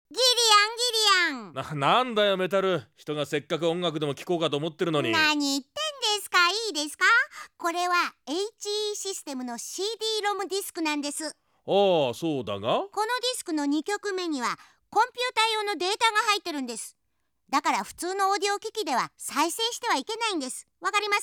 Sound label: Warning